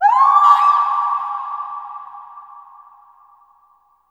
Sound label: BERBER YEL, FEM. NOISE; Spectrasonics; Heart of Africa